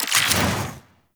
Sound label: Infuse Fire UI; Warframe; Warframes; Alchemist